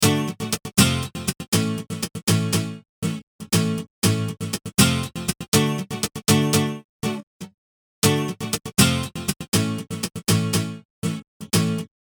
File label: acoustic